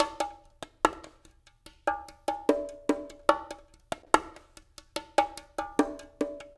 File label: BONGO; Spectrasonics; Liquid Grooves 1 & 2; MYSTEROUS